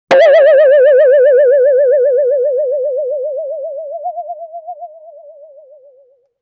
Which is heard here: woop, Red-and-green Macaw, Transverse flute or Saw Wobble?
Saw Wobble